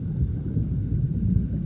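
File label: underwater tone; PC Gamer IT CD 41; Daikatana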